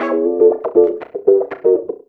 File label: 120 WAH WAH, Electric Guitar Loops